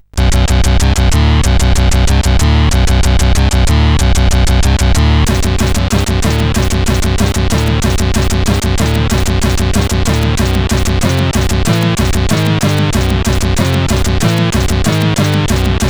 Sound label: Heavy Metal, CSG8580R5